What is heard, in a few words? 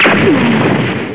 BULLET